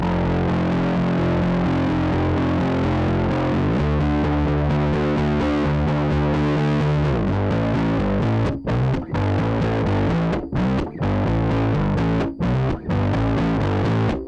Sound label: bass